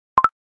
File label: notification sound effect; snapchat